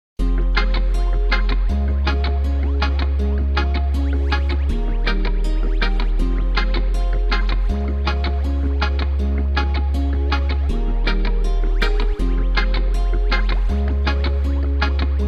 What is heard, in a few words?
reggae